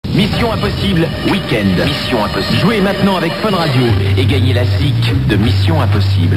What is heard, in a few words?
jingles